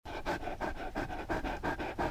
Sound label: pant, dog; enemy; Soldier Of Fortune II Double Helix; XBOX